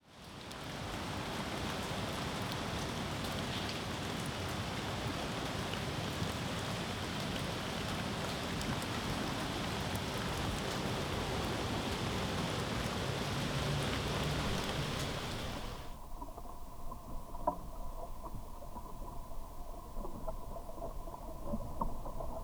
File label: Swedish Whitebeam Canopy And Internal; stories from the studios a tree